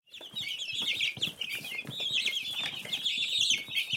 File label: baby chicks chirping sound